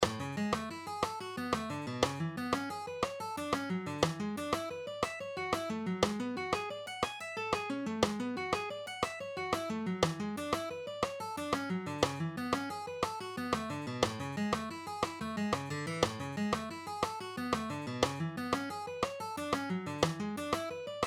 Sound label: String Skipping Exercises, Arpeggios in key of A Major; Original Speed; Frank Gamble’s Chop Builders